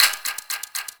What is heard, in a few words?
Hack Minigame UI Time Tick E